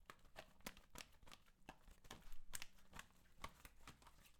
Wet Fish Moving Outside Water

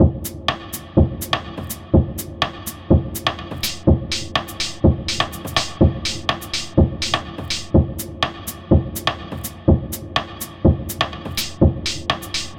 125bpm Style

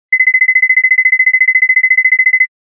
Cartoon Tiptoe

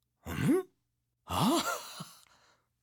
Male Reaction Cartoon Surprised

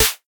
Snare

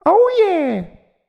Funny Cheering Shout

